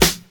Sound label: clean snare f key